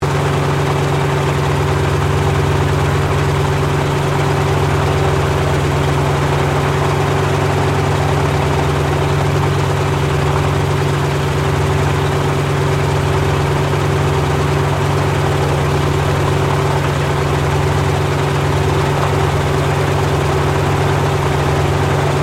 AIRCRAFT LOCKHEED HUDSON PREFLIGHT, Hudson twin engine light bomber; Airplanes; Vehicles